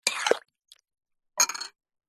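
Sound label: food cereal in bowl with milk scoop spoon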